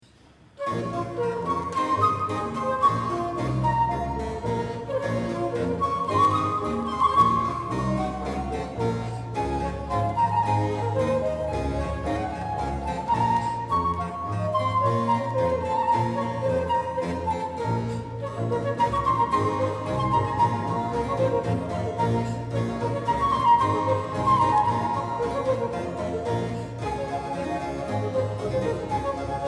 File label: Sonata for flute in b minor - Minuetto; Alexander Munro (1796-1773)